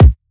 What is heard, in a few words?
drum kick drama; Virtual Riot; Drum Hits